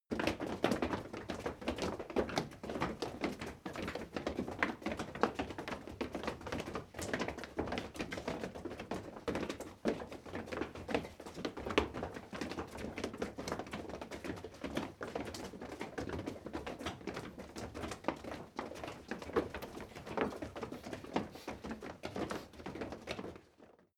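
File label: step crowd walk disordered sequence, footsteps; schritte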